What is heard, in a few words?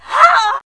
die; Genesis3D